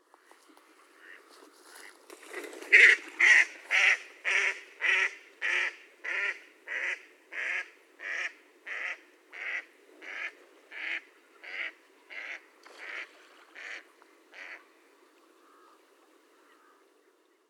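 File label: mallard anas platyrhynchos; kryakva; utok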